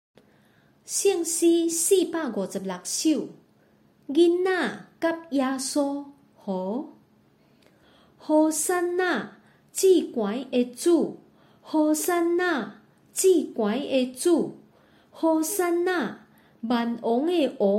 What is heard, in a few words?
Chorus, children; jesus and little children